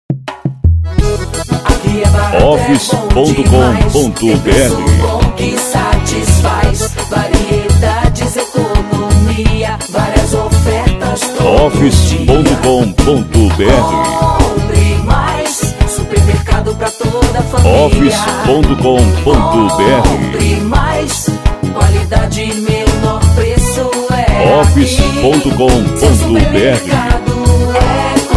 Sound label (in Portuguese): forro xote